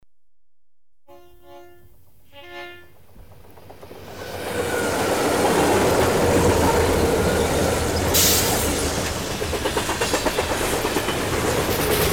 Freight Train with siren passes in desert, near Kingman, AZ; America; The BBC Sound Effects Library